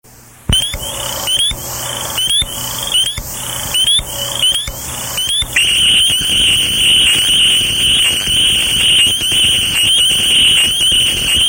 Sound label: spring